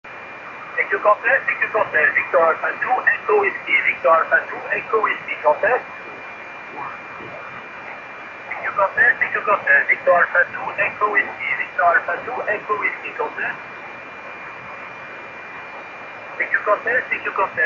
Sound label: backscatter 50121 KHz; FN35; VA2EW